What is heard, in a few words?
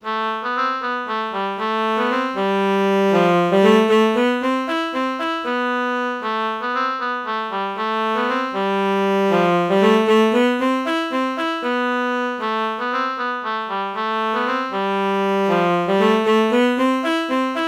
alto saxophone oboe melody morph low